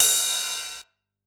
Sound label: Ride; musicradar; Drum Hits B